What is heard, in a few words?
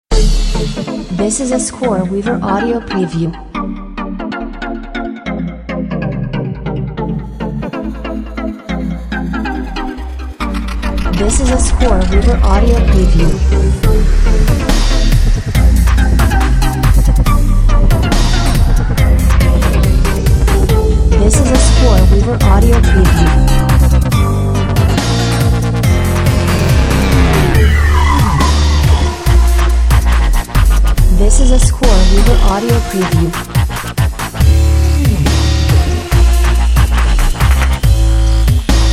dubstep; scoreweaver